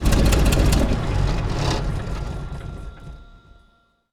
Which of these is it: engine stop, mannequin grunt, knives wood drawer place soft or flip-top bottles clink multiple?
engine stop